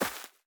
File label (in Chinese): 垂根踩踏声